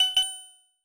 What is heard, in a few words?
Seatbelt